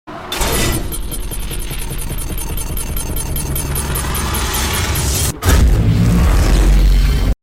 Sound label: emp lock on and hit; nfs hpr